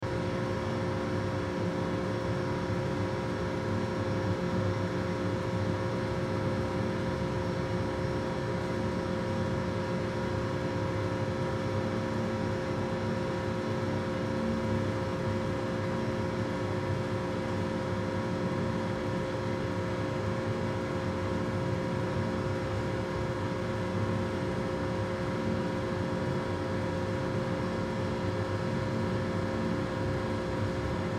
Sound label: Hotel Pool Indoor Pump Fluorescent Lights Room Tone Loop; Ambience; Blastwave